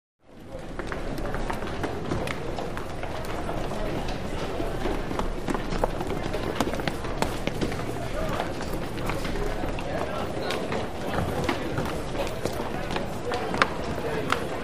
Lg Crowd Stairs Ext, lobbys

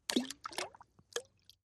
Splash Sml Stone Plop

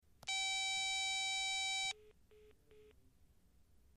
Telephone; Cell Phone Connect Key Beep.

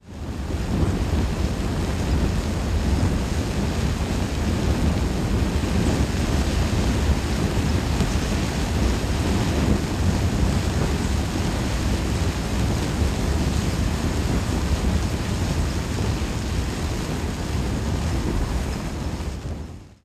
Gasoline Fire Medium

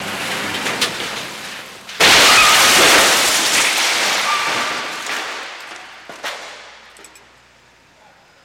Auto Crash Warehous, car crashes

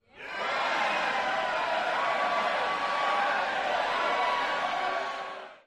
Crowd Int Murmur; medium int

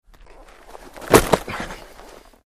Football Block Grunt, football

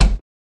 Door Close Low Sweet

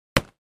Impact Hard, rocks & dirt

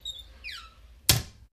Door Cupboard Close

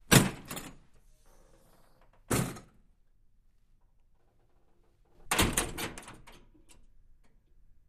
Door Push Bar Wood

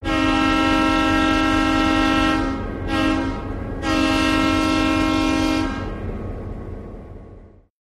Train Horn Blasts, diesel

